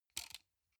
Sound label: sunglasses plastic grab soft